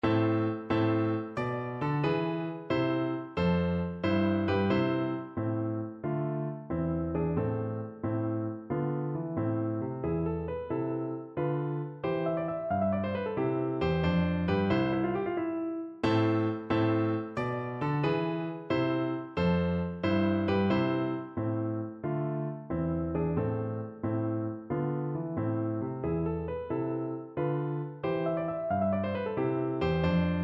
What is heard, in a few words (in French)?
Sonate en La mineur Gaillard clic, tuba